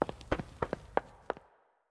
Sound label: Footsteps Wood Run Hall; the 39 steps